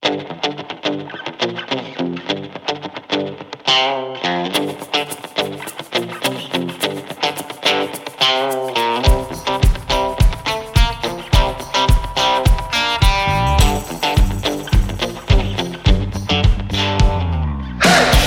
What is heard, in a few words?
Pick Up Heel Drop Step Music